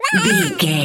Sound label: voice fun ant creature